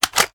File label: reload fast magout; viper